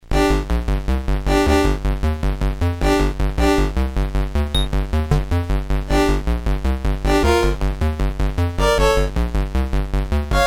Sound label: cms; silpheed going in fortress